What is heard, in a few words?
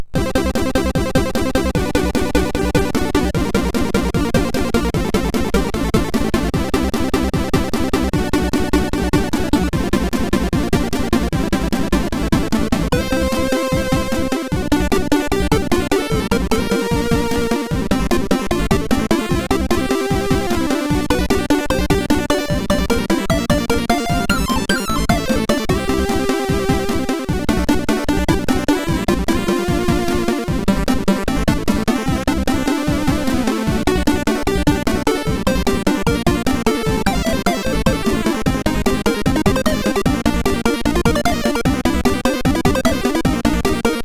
Robot Jet Action, MOS6581R4